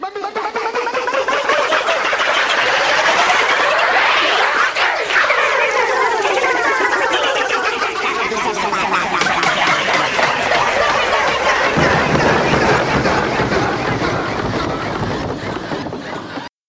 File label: Alien Spacecraft Startup And Shutdown